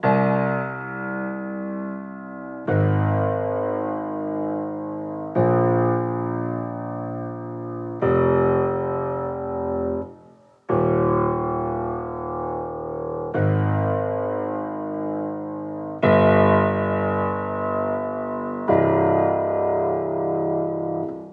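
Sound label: 90 piano C minor; iris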